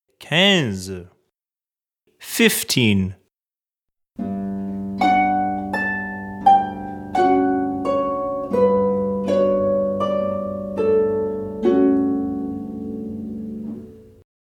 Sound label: Harp